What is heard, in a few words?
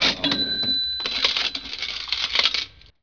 cash; BESTSHOT; noisybit